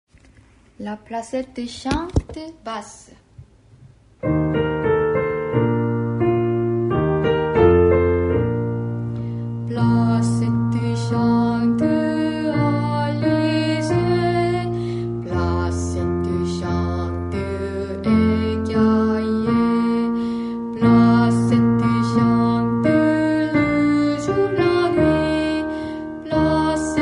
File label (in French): bass